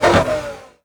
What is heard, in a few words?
shift down; F1; FSR 2010